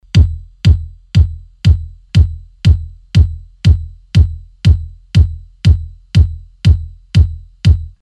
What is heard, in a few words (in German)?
BD Kick on Boost on; Telefunken M82